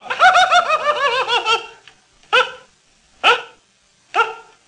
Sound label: Laugh